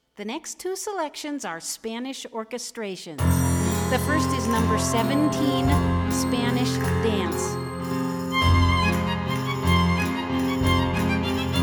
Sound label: Spanish Dance